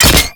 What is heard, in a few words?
Pistol Dry Fire; Warframe; Scaldra Pistol